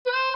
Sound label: harmonica; TamTamMicro.activity